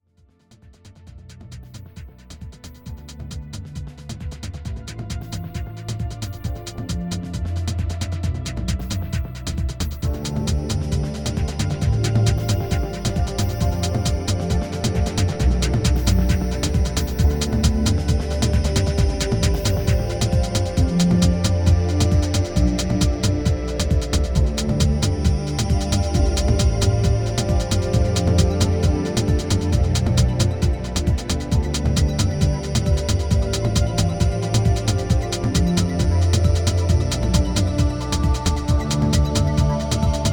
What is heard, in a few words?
2 Drum Mode